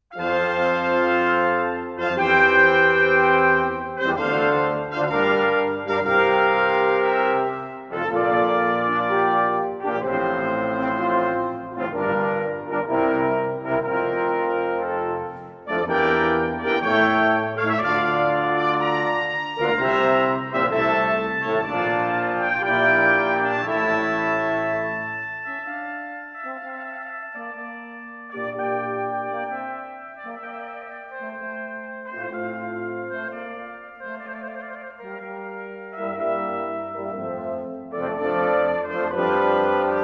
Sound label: Concerto Grosso